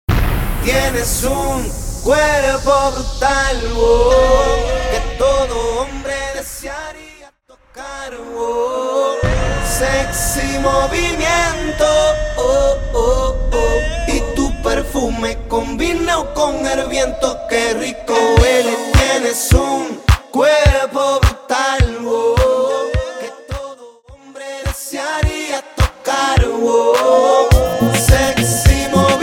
Regueton 105 bpm

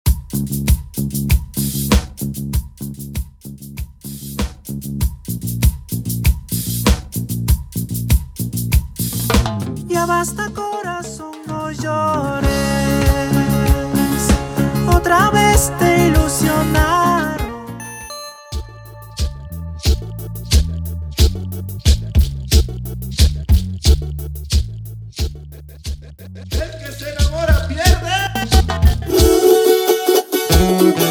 Huaynito Ecuatoriano

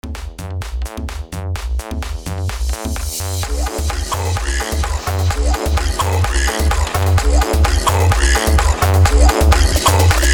Sandungueo 128 BPM

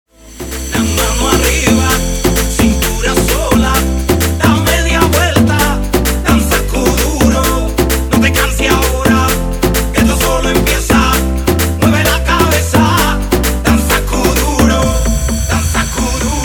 guaracha remix 130 bpm

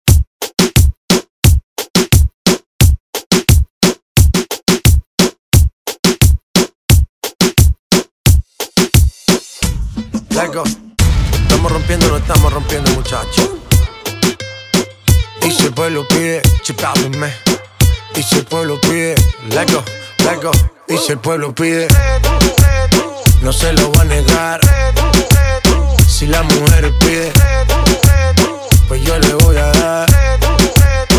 Reggaeton remix bpm 88

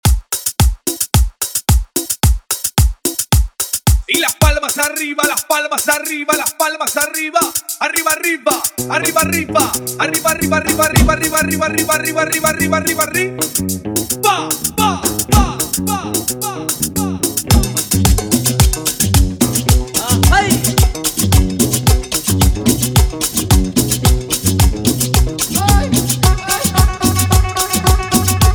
animacion 110 bpm

